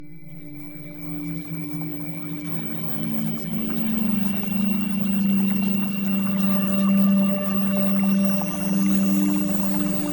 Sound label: Music